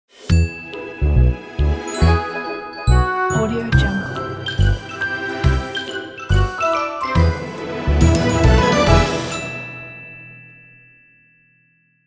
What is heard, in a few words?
funny cartoon ident; sound of rays